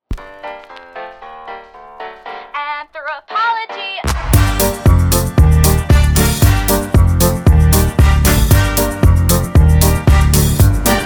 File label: Electroswing Remix